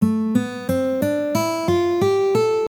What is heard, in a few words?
A Minor Scale